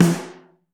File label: Snare Mono